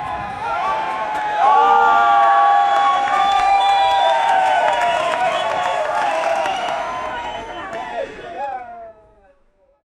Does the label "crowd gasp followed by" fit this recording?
Yes